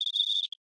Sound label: Env Void Cicada 3 Singles